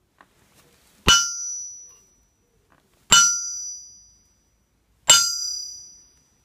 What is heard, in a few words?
copper hammer; چکش مسگری